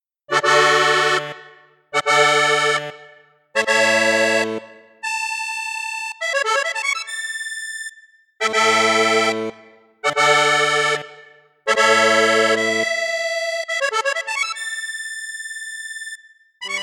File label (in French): registre flute basse flute juste flute haute, accordéon; maugein amusette